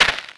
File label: dirt, player